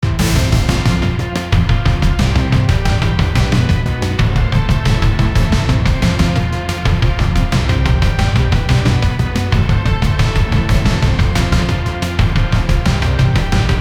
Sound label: heroic action heavy music; adventurous